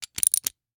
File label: Rachet, vanadium steel, 8in, standard, locking, clicking, mechanical, new, socket lock, rotate; Eiravaein Works; Vaeyan IV